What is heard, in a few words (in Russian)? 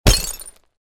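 пуля по бутылке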